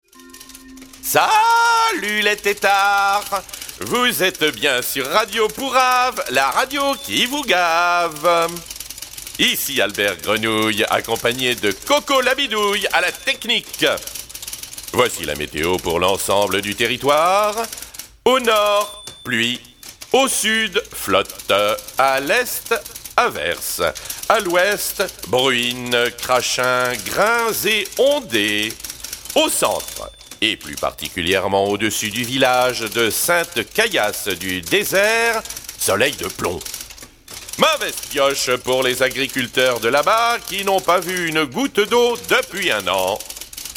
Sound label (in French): ext Eau